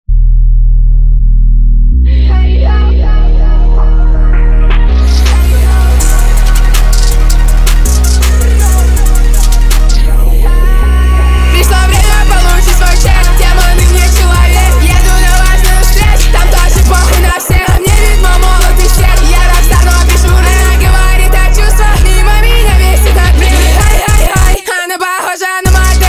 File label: speed bass